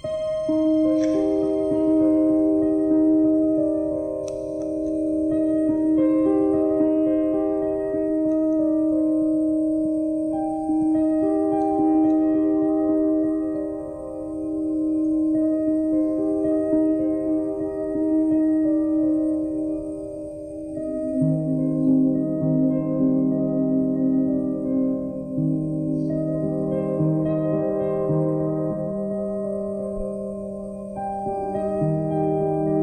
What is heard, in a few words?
Piano Medley